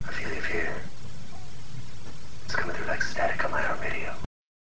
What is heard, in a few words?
static